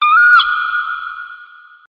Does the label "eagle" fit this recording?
Yes